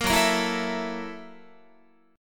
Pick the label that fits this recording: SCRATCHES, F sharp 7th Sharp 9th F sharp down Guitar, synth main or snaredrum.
F sharp 7th Sharp 9th F sharp down Guitar